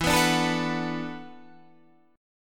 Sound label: E 9th Suspended 4th E down Guitar Standard; E9sus4 strummed; JGuitar